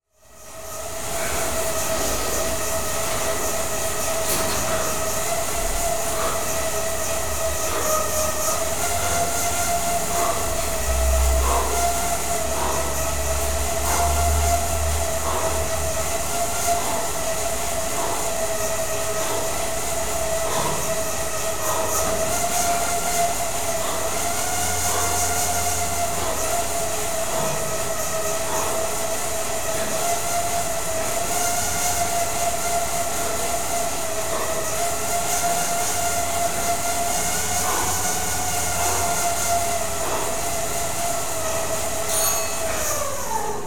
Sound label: Exercise Bike - Hydraulic, with 'time's up' bell; The BBC Sound Effects Library; Sport and Leisure